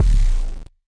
long 8bit; Hip Hop Specialty Kit; STYLE KITS (Hip Hop, Etc)

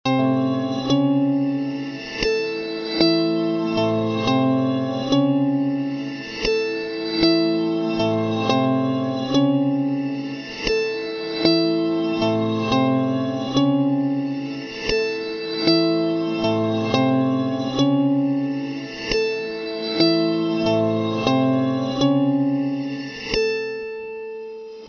ambient bell guitar

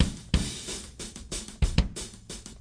092 funki classic, drum loops and breaks; 091 to 100 bpm

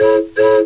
lofi toot toot steam whistle; Sound FX Real Life

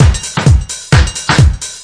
harsh house, drum loops and breaks, 130 bpm

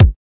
kick

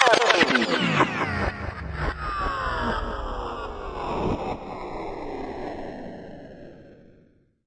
echo spindown collapse; Electro and Synthetic

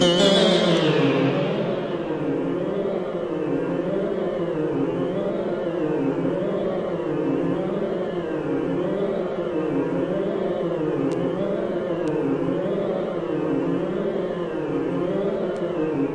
warping thingy, Guitars; fukdup; single samples

